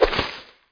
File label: swing fist; MOTS